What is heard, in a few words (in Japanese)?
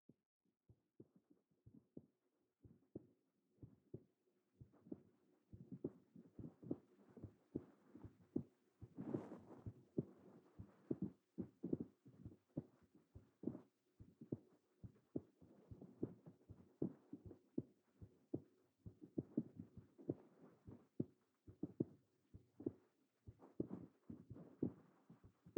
心音 右耳